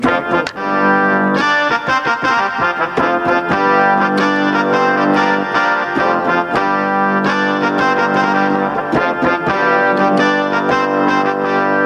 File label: horns